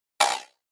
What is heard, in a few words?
Flash Gear Metallic